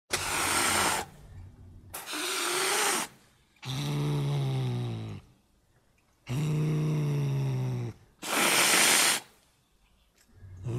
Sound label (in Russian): kot dostaet pticu v kletke